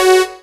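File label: synthesizer Dance; Best Service Gigapack 1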